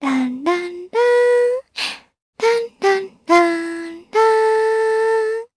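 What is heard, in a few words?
Vox Hum, Japanese; Talisha; kingsraid audio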